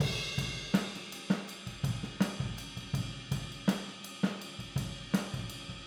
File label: Boogaloo Chorus Overhead ST, 82 BPM